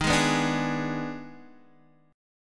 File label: Eb Major 7th Suspended 4th Sharp 5th, Guitar Open D; x,6,6,5,5,6; down